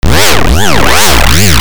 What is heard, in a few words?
ALARMS